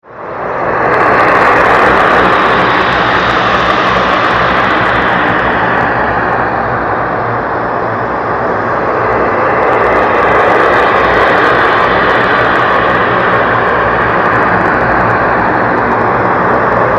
Monster Lair, Horror; 5.1; BlastWave

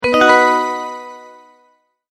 multimedia tone advance; Sound Design; McKinney Sound

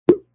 Knock; Knocks

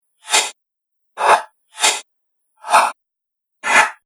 Sharp Chopper Loop, machinery; Looping; Fantasy, Horror And Sci-Fi Games; Andrew Fleming

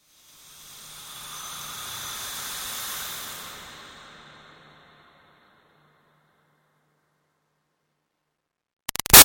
Swell Airy Toxin Steam Release Short; Sound Design; Swells

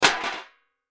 Impact Metal Trash Can Lid Drop; Impact Metal Trash Can Lid Drop Small; Metal (Sound Effects:Materials); Blastwave

